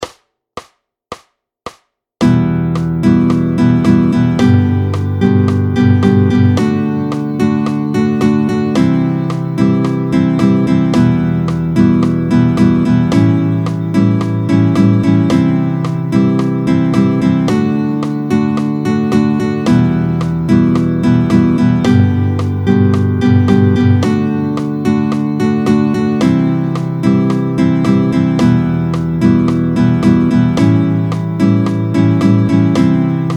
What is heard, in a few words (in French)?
Vite, rythmique tempo 110